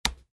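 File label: sounds of playing checkers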